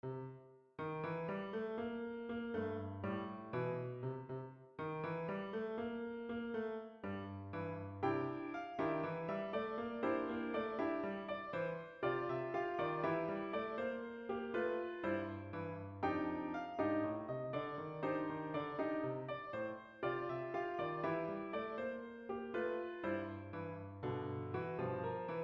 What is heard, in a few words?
Deck The Halls Duet